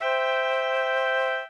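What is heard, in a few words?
Maj Fl Cl Ob, major flute clarinet oboe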